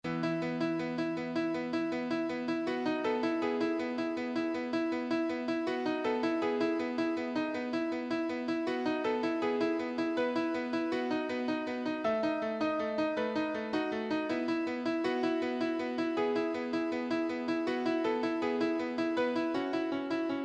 rasiya accompaniment, piano

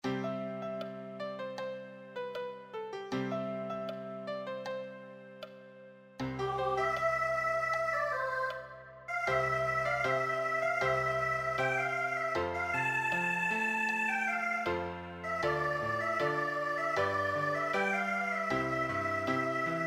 accompaniment

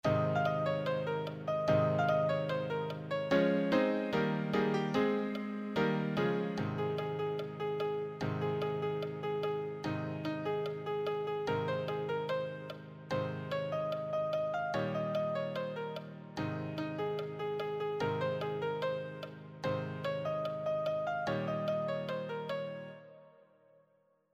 easy solo, piano

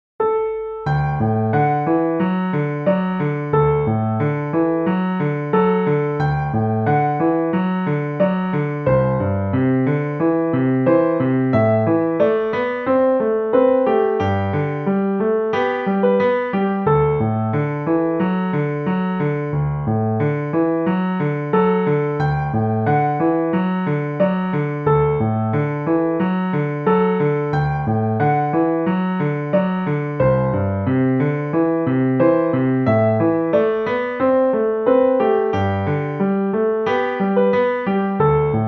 solo, piano

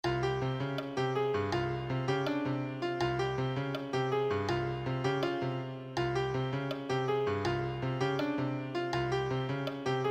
solo, piano

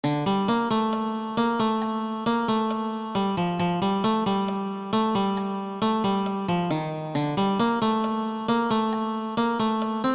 guitar

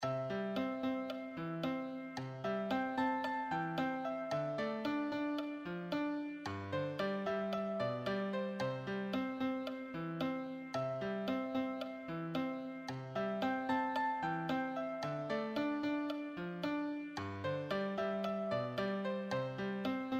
solo, piano

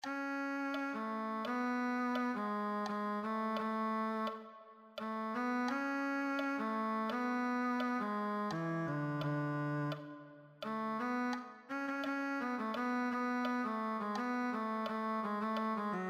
cello

